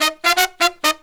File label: 132, Pop & Funk Brass